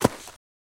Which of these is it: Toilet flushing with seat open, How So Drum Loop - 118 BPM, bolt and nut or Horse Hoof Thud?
Horse Hoof Thud